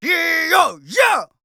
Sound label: Yells, Viking Vocal